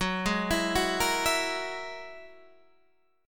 G sharp 7th Suspended 2nd Sharp 5th; 14,11,14,11,11,12; chord shape

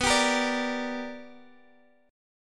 B Minor Major 7th Sharp 5th, Guitar, Open D

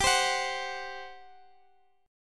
G Major 7th Suspended 4th G down Guitar Standard; GM7sus4; strummed; capo=3

